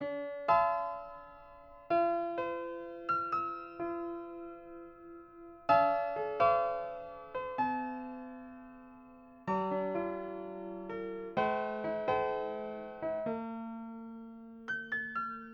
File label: piano bar